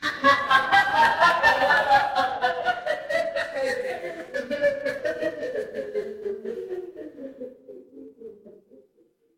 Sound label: crowd laughter